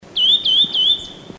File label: Eurasian Nuthatch, birdcall